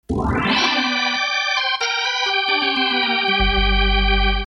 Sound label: Hammond win logo ident stinger; ufs league switch gamerip 2022